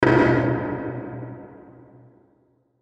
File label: Hit Drum Low; Hit Drum High Hollow; Blastwave